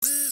Electricity MMC insert; Nokia Ringtones; Audio themes

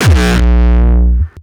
kick; shock. Ultimate Kicks vol. 2

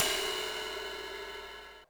CYMBAL, Yamaha RM 50

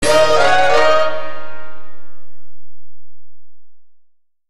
Chinese alarm; Nokia Ringtones; Audio themes

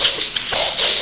Numb Loop; Sound FX

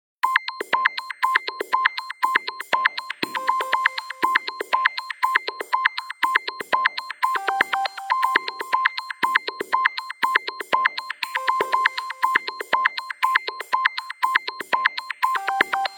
Knock, Wakeuptones; Nokia Ringtones; 8800 Arte

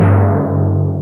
Tom, Yamaha TG-33; Drum Samples

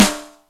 Snaredrum, Roland D-70; Drum Samples

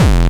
bombo, kicks, Hardcore

